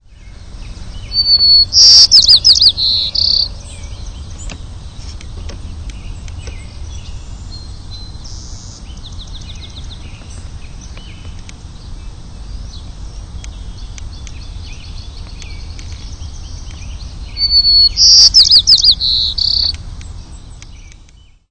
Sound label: White-crowned Sparrow; Birds